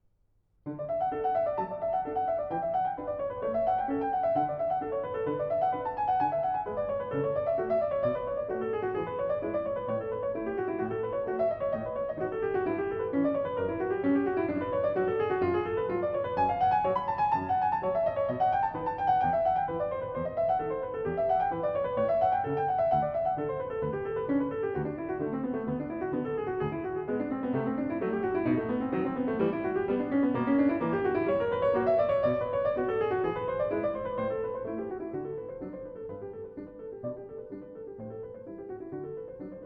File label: Das Wohltemperierte Klavier Prelude; J.S. Bach; Book 1; Prelude in D major